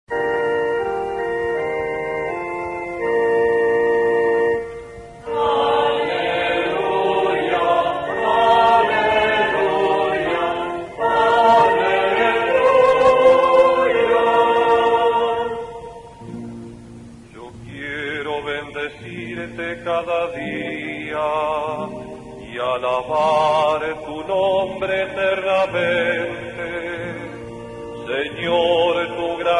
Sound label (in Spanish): Aleluia